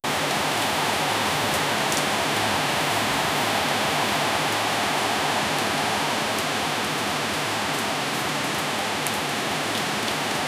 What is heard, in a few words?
soft rainfall ambiance